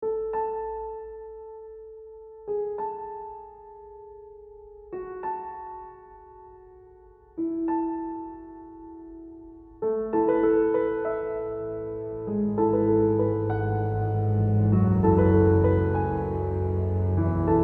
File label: COMMERCIAL